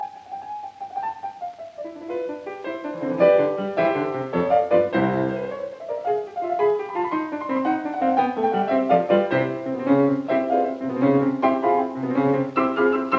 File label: Piano Sonata in C major Scherzo Allegro; Op 2 No 3; Claudio Arrau